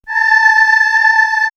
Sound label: Choir; X-Static Goldmine; backtothe70's